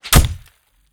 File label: knife hit; arccw go